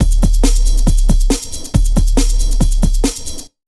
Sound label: Big Beat Drumloops, 138 BT; USB Soundscan vol.25; AKAI; MOVE 138